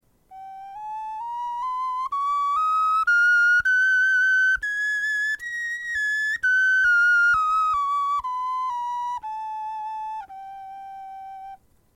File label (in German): tonleiter